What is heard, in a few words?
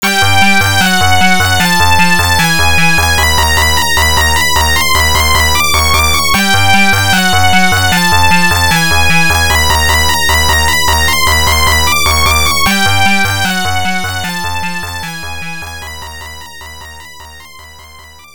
Combat BGM; Dragon Buster; PC-8801